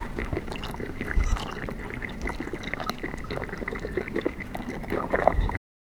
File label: nature landscape sound, leisure